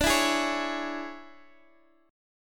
C sharp Minor D; C#m/D; strummed; JGuitar

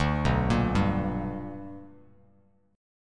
B Minor Major 7th Sharp 5th Bass Standard; arpeggiated; JGuitar

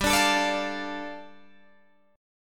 G 7th Suspended 2nd G, Guitar Open D

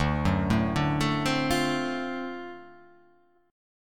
D 7th Sharp 9th D down, chord; D7#9 arpeggiated; JGuitar

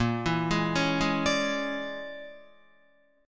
Bb 7th Sharp 9th Flat 5th Bb, Guitar; Bb7#9b5; arpeggiated; JGuitar

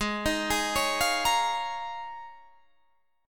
Eb 7th Suspended 2nd Suspended 4th Ab

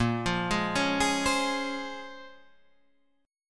Bb Minor 9th Bb, Guitar, Half Step Down; Bbm9

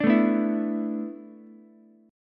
E 7th Suspended 4th, Ukulele; E7sus4 strummed; JGuitar

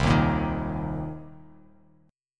C Minor 7th C

